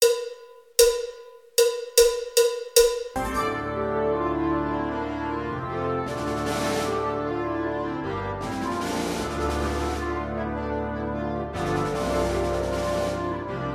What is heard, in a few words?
Spanish Dance